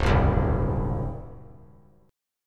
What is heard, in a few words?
C sharp Major 7th Suspended 2nd Suspended 4th, C sharp, chord; strummed